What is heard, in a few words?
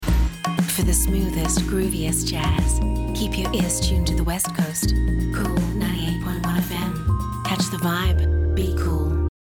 SMOOTH JAZZ